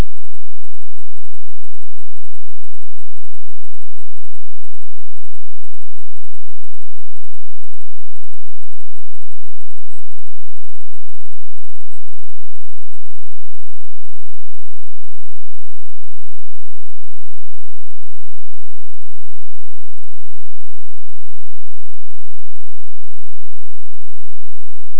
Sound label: hall; Compy Shop Magazin 1990 01 de